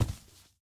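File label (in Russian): глухой короткий чёткий звук выстрела салюта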